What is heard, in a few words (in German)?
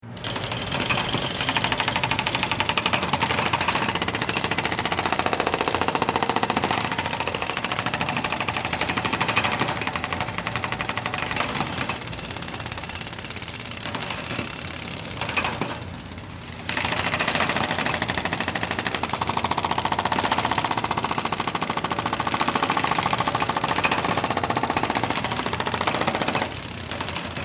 Presslufthammer